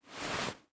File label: rustling of clothes